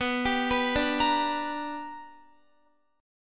B-Minor Major 7th Sharp 5th B down Guitar; BmM7#5 arpeggiated

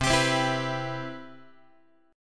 C Major 7th, Guitar; CM7 strummed; JGuitar

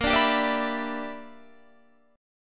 E Minor 7th Bb; Em7/Bb; strummed

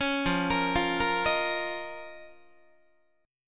F 7th Suspended 4th Sharp 5th F down Guitar; arpeggiated

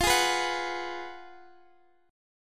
F sharp Major 7th Suspended 2nd Suspended 4th, F

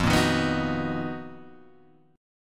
B Major 7th F, chord; Bmaj7/F strummed; JGuitar

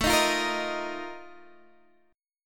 A sharp Major 7th Suspended 4th A

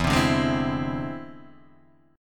E Augmented 7th E down; E+7 strummed; JGuitar

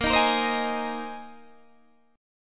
Bb 9th Suspended 4th Bb down Guitar Open D

